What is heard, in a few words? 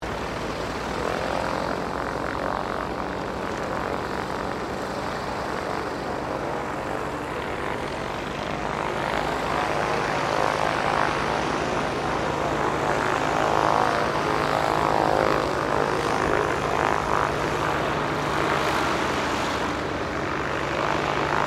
AIRCRAFT STINSON RELIANT SR-8C PREFLIGHT; 1935 Stinson Reliant; Airplane Sound Effects; Vehicles